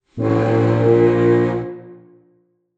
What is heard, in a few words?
growl; outfly